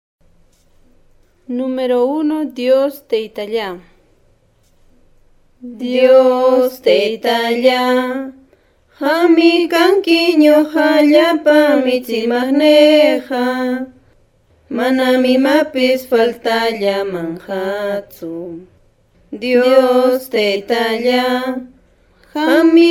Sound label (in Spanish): cancion